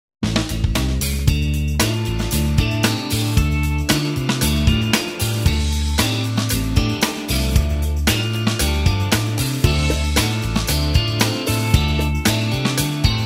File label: PURPLE ELECTRIC GUITAR